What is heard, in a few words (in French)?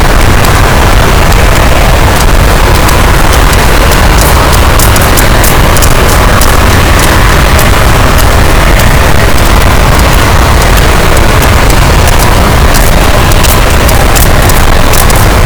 cachalot positif, boat, Physeter Macrocephalus